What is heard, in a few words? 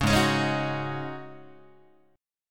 Ab 7th Suspended 4th Ab down Guitar